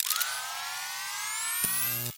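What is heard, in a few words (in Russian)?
настройка объектива фотоаппарата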